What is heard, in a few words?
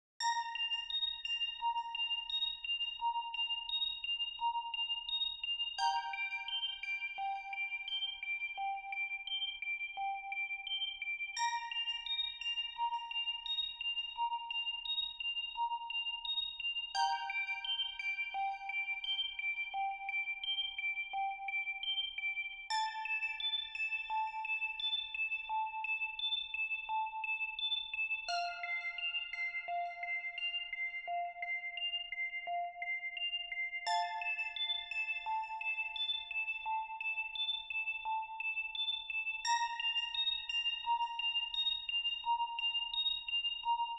synth